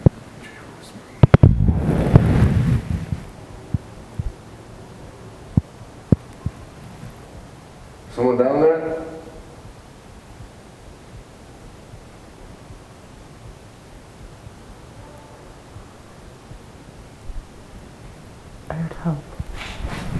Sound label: scream and help